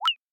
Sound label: Digital Click; Robobeat